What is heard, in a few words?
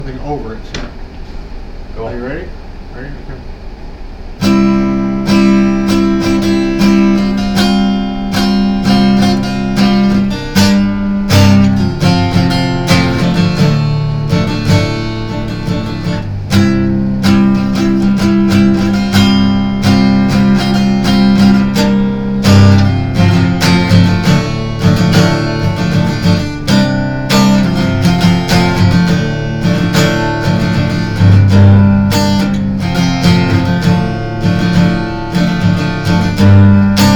instrumental; omf